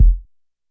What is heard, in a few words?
BD Ana Soft L; YAMAHA RM1X